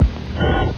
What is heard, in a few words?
Tone